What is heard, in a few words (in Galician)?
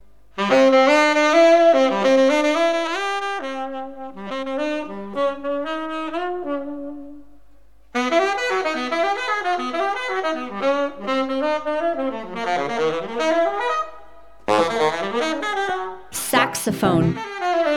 saxofon; Yamaha Saxophone YTS-62; Yamaha Corporation